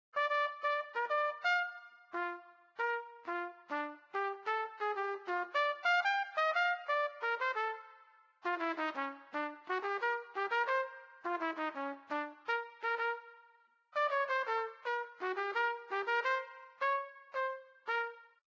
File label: Trumpet; Zomboid; Lifestyle